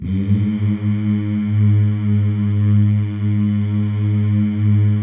male; Spectrasonics; Symphony of Voices

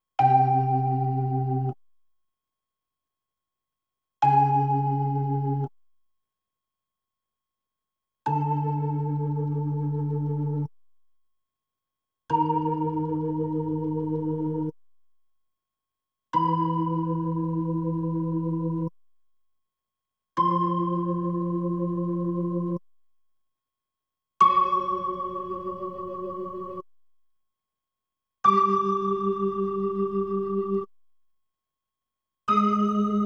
B3 Organ; Masterbits CLIMAX 8

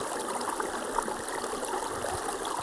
Waterway G Dark

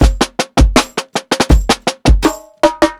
PICCOLO MARCHY, breaks, drums; Basement drums vol 2

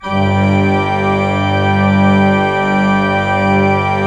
ORG, CHURCH ORGAN; Roland - LA Composer vol. 1

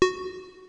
W7 beat kit; Rubber Chicken Software Sample Bank

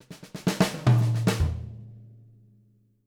SWING, Living Drums

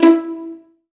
Pizz E 3, STRING; Best Service Gigapack 1; STRING 2

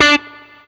BLU POP, Guitar & Bass

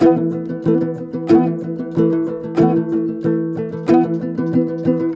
TOPSHUR; Soundscan vol.60; Asian Traditions; AKAI

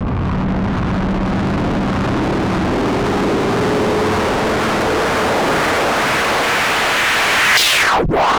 DISTORTO, Trance; ILIO; Trance Fusion; STOP LONG

